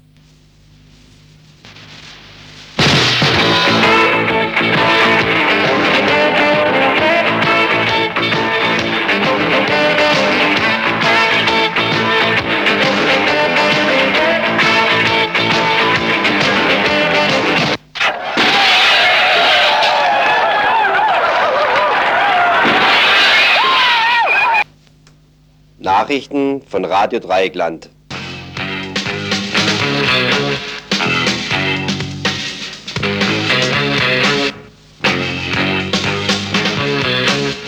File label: jingle; Historisches Tagesinfo